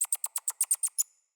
Night Creature Close; Night One Offs; Ambience

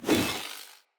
Lightning Grenade Projectile By; Weapons; Enemy

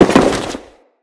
Crewman Land Default, Footsteps; Enemies; Corpus

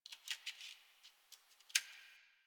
Computer Room Floppy Disc; Warframe; Ambience; Nineteen Ninety Nine

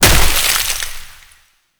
Proc Cold End; Warframe; Mods

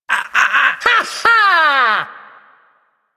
Ability Lift Laugh Corpus Lich; Warframe; Persona A

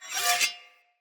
Sphere Sword Whoosh Accent; Weapons; Swords; Sphere Sword And Board

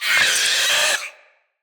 Spider Elite Vocal Death; Root Spider Elite

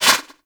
Turbulence Deflect; Warframe; Tengu

